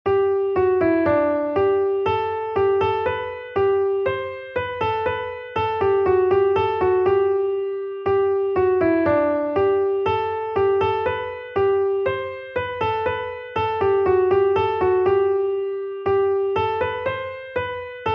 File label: melody; praise jehovah all ye nations